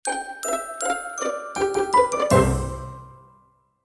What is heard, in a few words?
success; puzzleweave